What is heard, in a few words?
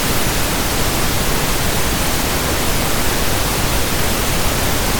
pink noise; OpenValley